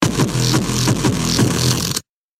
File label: Stinger